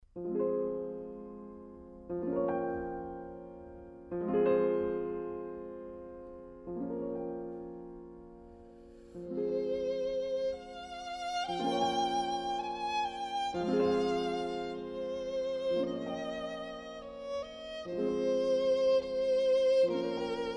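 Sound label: violin; juon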